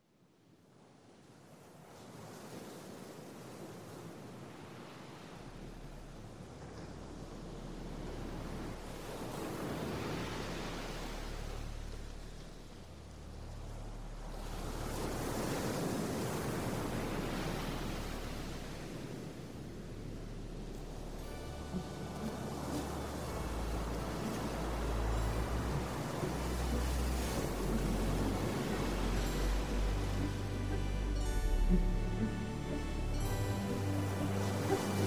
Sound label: Canon with Ocean Sounds, Sounds of Nature